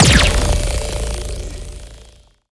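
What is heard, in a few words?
Sci weapon Shot Beam Medium Metal Melter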